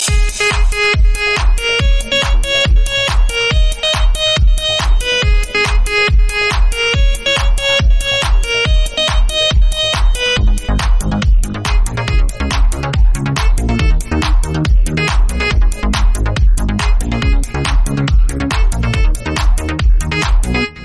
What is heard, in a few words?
techno song